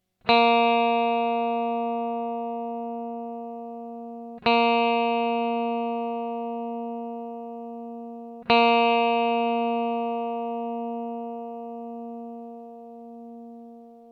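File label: open g minor b flat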